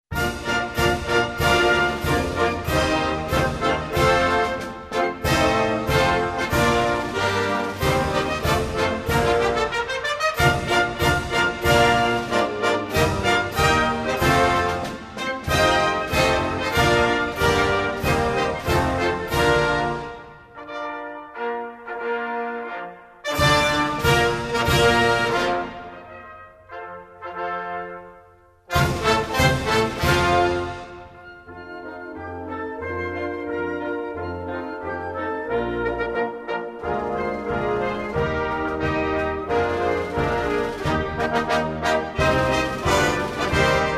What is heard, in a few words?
anthem